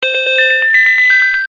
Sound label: train ride